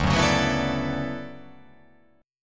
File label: D Major 13th D down